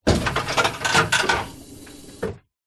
truck ramp tail lift release drop, vehicles

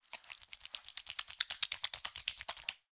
hair gel rub squelch in hands

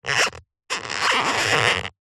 foley plastic squeak creak tighten turn; zapsplat

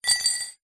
household cutlery items x2 set down into glass; zapsplat

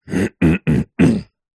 human male 38 years old clear throat

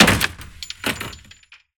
Impacts; Just Impacts; Extension I; soundbits

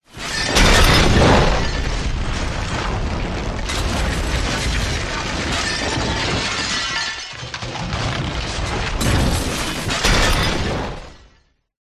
impacts massive junk debris impact with whooshes; zapsplat

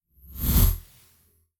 Whoosh SciFi; bottlerocket

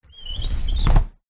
household roller door close; zapsplat

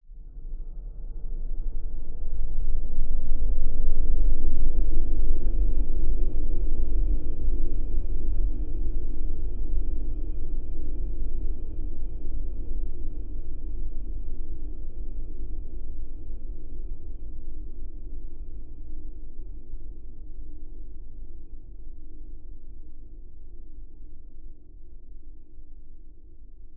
cinematic droning swell long dark howling evil; zapsplat

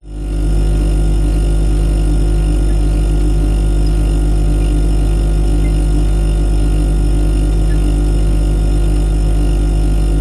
household refrigerator hum close up

